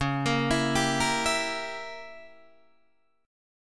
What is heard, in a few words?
Db Diminished 7th, Guitar, Open D